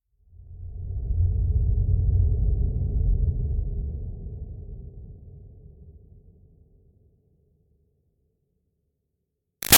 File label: Swell Rumble Ethereal; Sound Design